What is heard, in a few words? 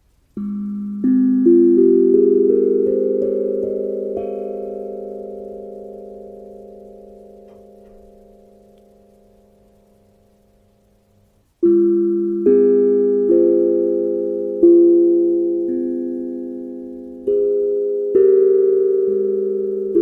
F Minor 432Hz